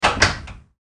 Gear Sound; pocket-rocket

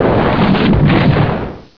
Take off (short); Bedlam 2 1.1.1; Bedlam Sounds

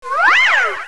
weeo; 7invasores

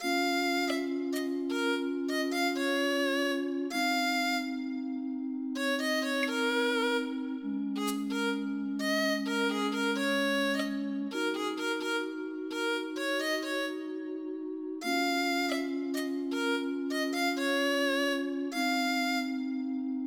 ringtones; MIDI Music File; General MIDI (type 1)

